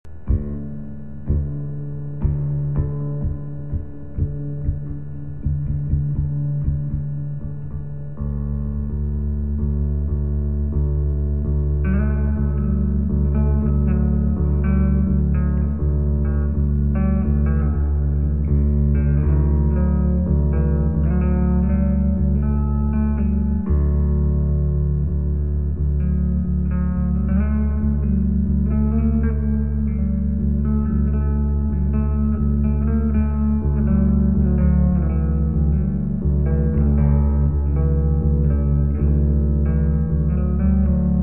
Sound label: two strings only